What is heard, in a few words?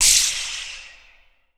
SHEET METAL, Definitive Percussion